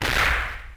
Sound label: Punch; RedDot